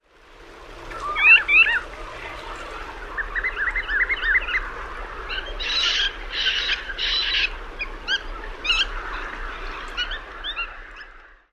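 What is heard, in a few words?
flock interaction calls, Hudsonian godwit; AK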